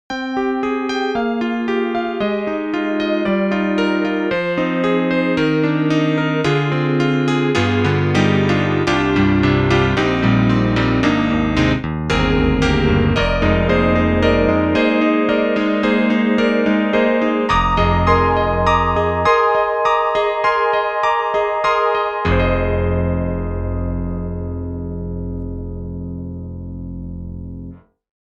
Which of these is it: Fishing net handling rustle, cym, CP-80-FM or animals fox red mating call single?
CP-80-FM